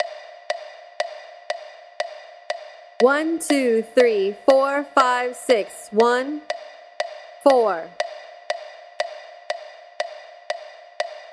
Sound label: SLOW; 6/8 Example Slow